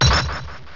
Ice Ball; pokerogue; battle anims

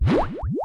Substitute; pokerogue; battle anims

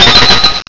Ice Hammer; pokerogue; battle anims

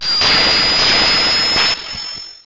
Blast Burn, battle anims; pokerogue

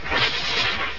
String Shot; battle anims; pokerogue

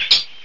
Sweet Kiss; pokerogue; battle anims

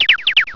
Chatter, battle anims; pokerogue

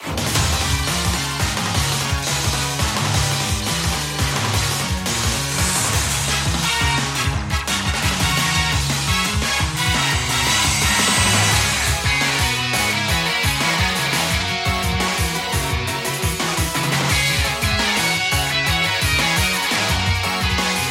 battle; pokerogue; bgm; macro grunt

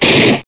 Slash; pokerogue; battle anims

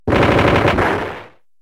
Mining Collapse; pokerogue; battle anims

